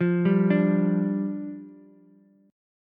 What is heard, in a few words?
D Minor Double Flat 5th, down, Ukulele; Dmbb5; arpeggiated; JGuitar